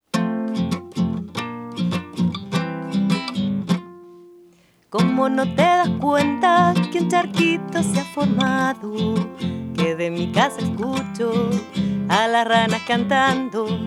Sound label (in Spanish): Las ranas, canción